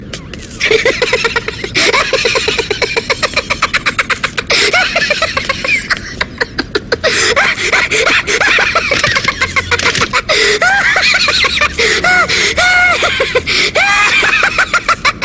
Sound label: zoo zoo laugh